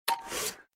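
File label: Button History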